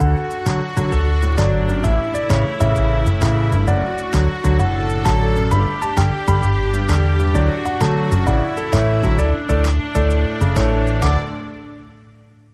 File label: Bumper